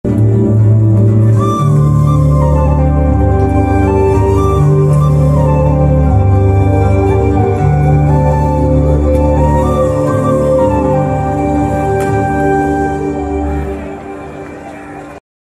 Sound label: flute bgm ringtone; raayan movie; dhanush